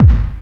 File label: Dubstep Kicks